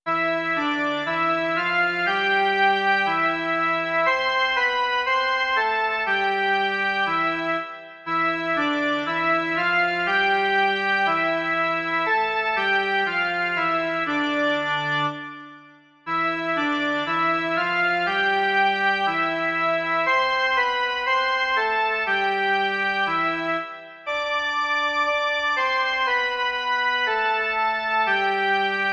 jen melodie

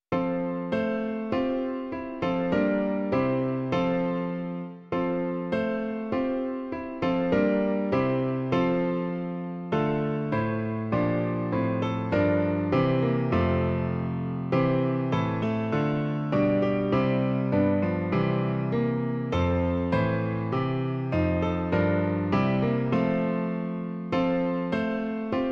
klavír; Bože, jenžs dal život nám; Klavírní verze

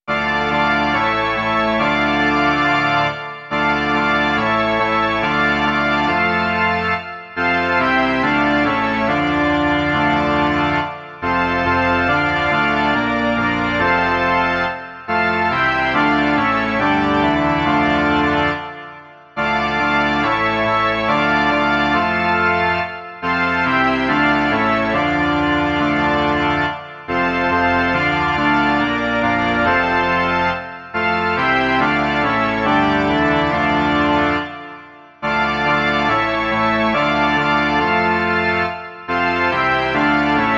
varhany; Všichni věrní křesťané

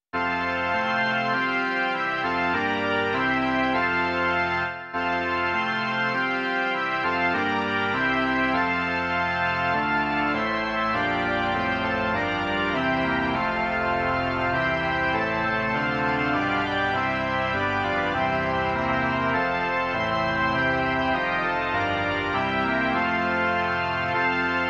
varhany

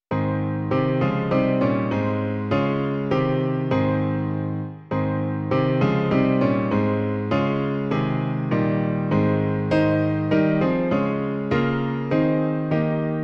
klavír; Ty nás proveď, Hospodine; Klavírní verze

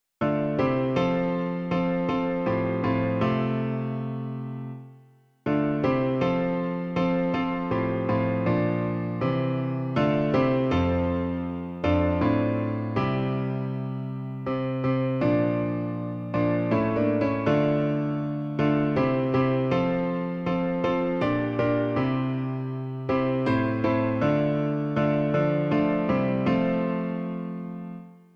klavír, Klavírní verze

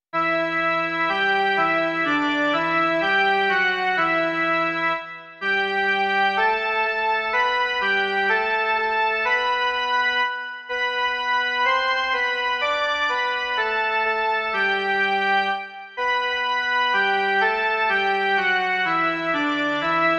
jen melodie; Ó veď nás, Pane, v slovu svém

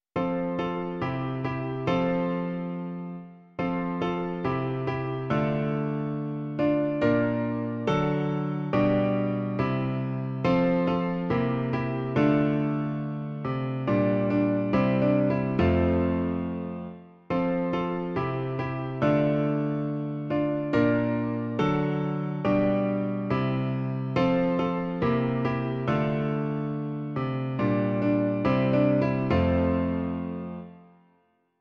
klavír, Klavírní verze

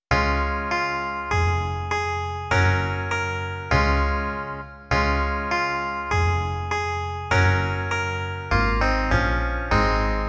el. klavichord a kontrabas; Imaginativní verze

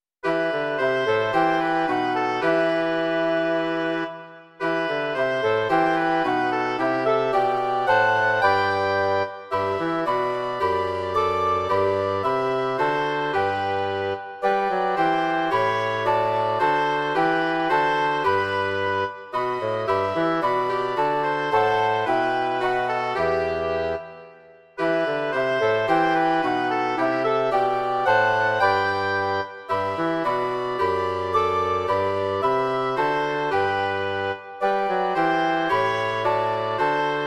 dechový kvartet; Opatruj mě z vysokosti; Imaginativní verze

